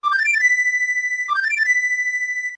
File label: Flute; LTTP; Flute Boy's pet bird; Link